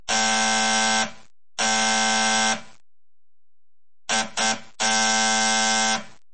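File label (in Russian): нетерпеливое жужжание дверного звонка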